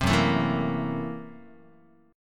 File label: F sharp 7th Sharp 9th Flat 5th Guitar, chord; 4A,4E,5A,5D,5Gsharp,6Csharp; strummed